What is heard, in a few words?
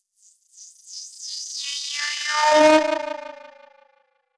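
alarm siren, filter processed, 170bpm; musicradar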